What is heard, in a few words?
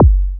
BD, Dance Mega Drums, BD HOU; Best Service